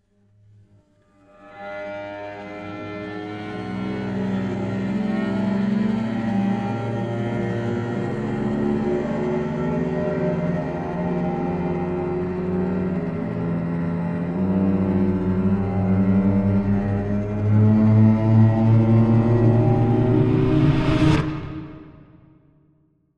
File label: Riser